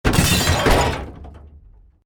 CRASH VEHICLE LARGE GLASS, vehicle accident sounds; A series of vehicle accident sounds created using FMOD; Crashes